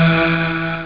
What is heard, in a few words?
Harmony; Sapiens 2.0